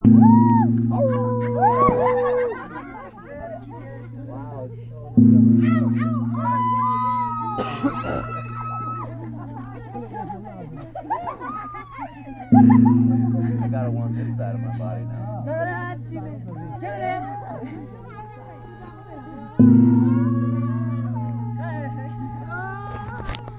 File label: scream at the moon; screaming and howling; nepal; I could see a bonfire and I heard screaming and howling